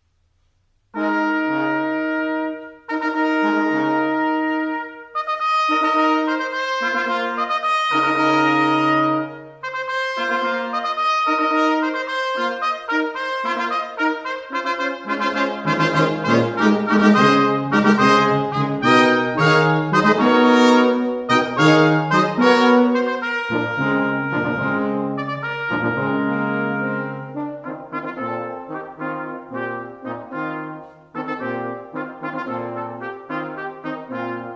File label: Fanfare